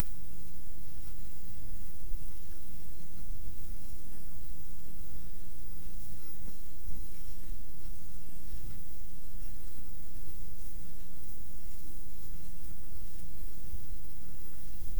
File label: create cartoon style step, foley